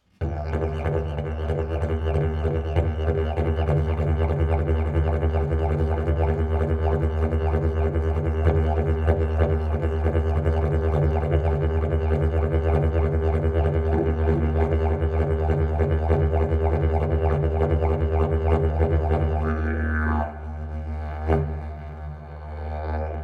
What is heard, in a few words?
didge